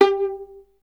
STR VLN, Solo Instruments; Miroslav Vitous

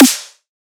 Hard Snare; Antidote Advent; Infected Track Drums

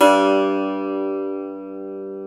SAZ, Eth Plucks; Pluck Duo

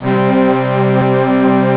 string; ST-XX Amiga Soundtracker Sample Packs

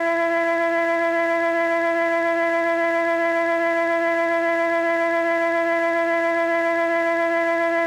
flute A, FLUTES; Best Service ProSamples vol.55 - Retro Sampler; AKAI

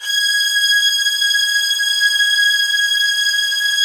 G#6, soft violins; Q Up Arts; Denny Jaeger Master Violin Library

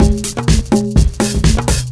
Big Latin Conga, Drum Loops, 127 BPM; Sonic Foundry (Sony Creative Software); Underground UK House & Electro

